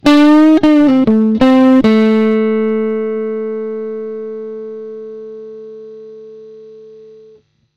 Korg SDD-3000 test distorted; uad

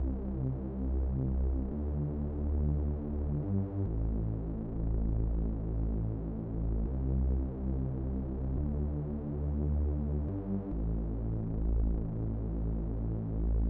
osc a

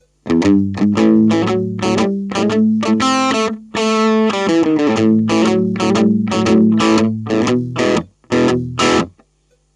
esp bluesy

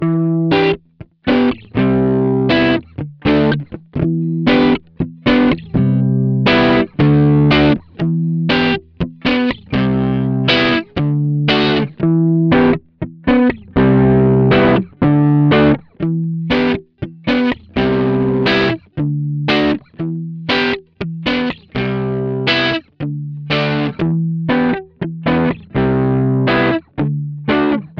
Orange TH30 clean, Gibson Les Paul; palmer pdi03

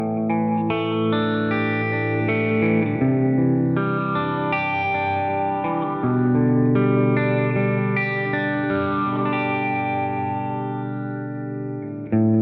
tonal ambient; Positive Grid Bias FX 2

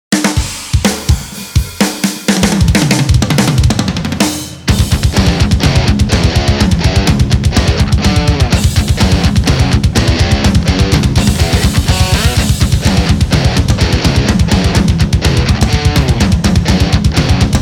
metal machine ezx song

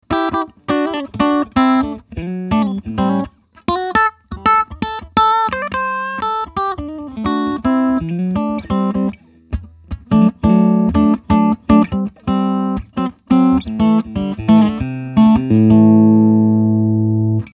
Vox MV50 clean test strat

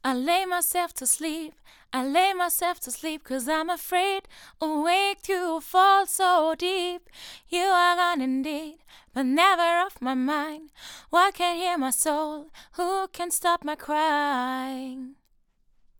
vocals; rode nt1a

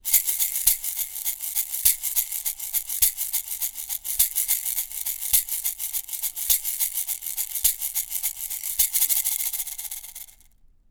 Mangabey Shaker; monkey banana mangabey test

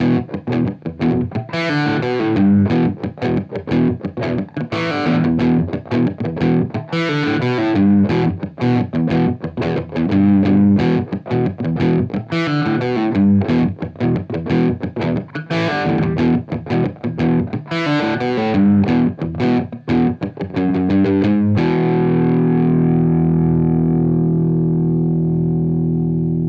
orange 4x12 thick; universal audio ox amp top box

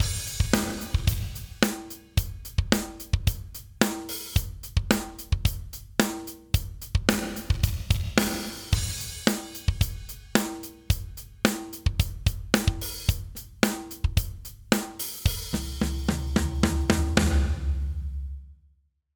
fg-6000 room; slate digital verbsuite classics

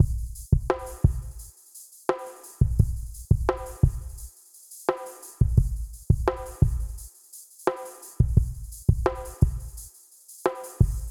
addictive drums; sonar platinum; addictive drums 2

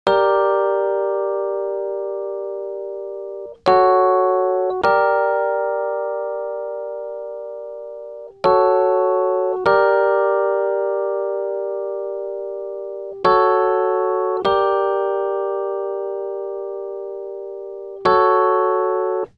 moog multimode filter xl rhodes; universal audio

